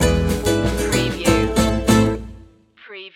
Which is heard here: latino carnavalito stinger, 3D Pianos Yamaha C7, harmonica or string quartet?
latino carnavalito stinger